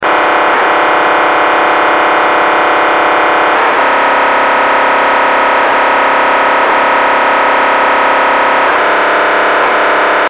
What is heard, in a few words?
OpenSky